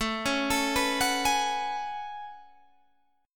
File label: A Major 13th, down Guitar Standard; AM13; arpeggiated; JGuitar